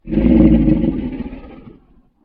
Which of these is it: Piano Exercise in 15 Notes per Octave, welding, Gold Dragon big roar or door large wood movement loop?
Gold Dragon big roar